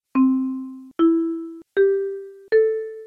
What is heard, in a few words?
Sounds Amplified